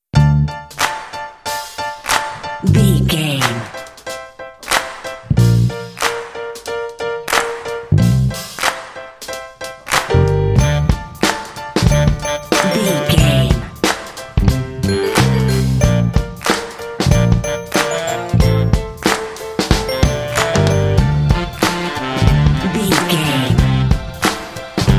cutie baby funk

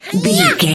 voice female attack

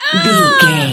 voice female death

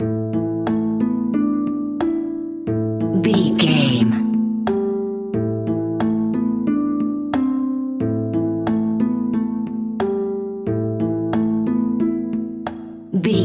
harp peaceful loop

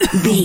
voice male cough short

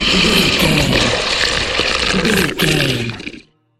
troll monster growl long

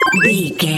collect item sparkle pop

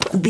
bow crossbow place arrow load

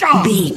voice male hurt pain

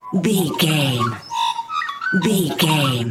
bird med songbird magpie crow call chirp

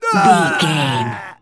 voice male grunt pain death

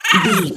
goblin fairy hurt pain

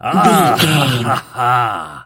voice male laugh short

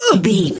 voice female hurt pain low

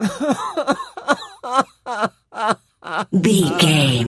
voice male cry high

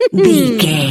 voice female expression emote

